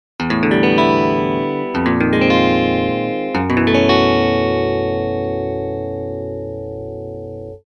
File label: roll; eastwest